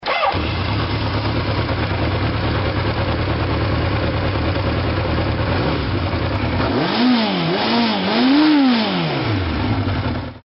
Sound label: 900 boldor, motos